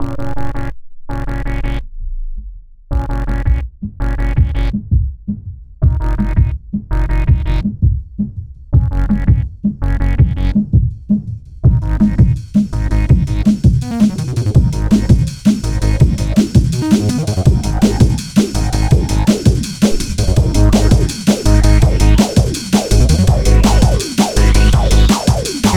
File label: flower child filter